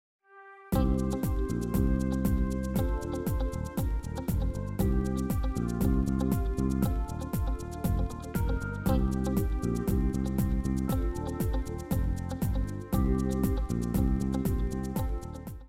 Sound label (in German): musik Chillout Groove